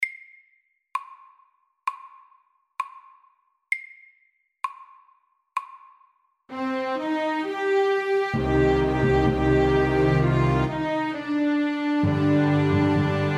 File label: Lament MEDIUM 65